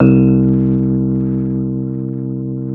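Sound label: AMP FENDER C2, Keyboards Old School; USB Soundscan vol.09; AKAI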